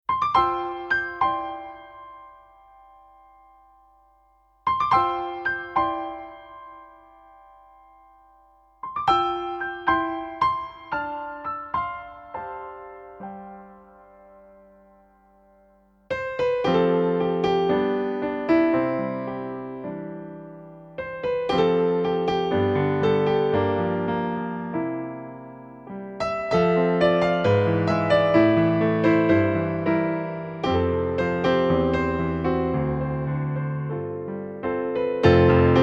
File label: Piano